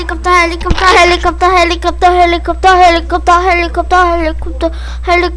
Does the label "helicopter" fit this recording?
Yes